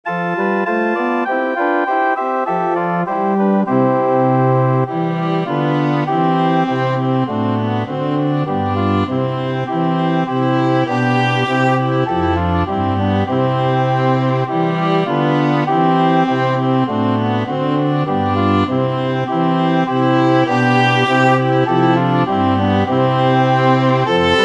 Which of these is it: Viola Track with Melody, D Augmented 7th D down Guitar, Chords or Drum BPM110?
Viola Track with Melody